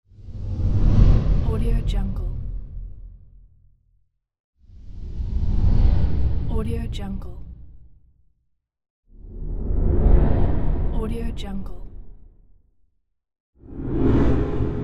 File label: ghostly whooshes; floraphonic